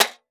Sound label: Impact Sheet Metal Hit By Plastic Tube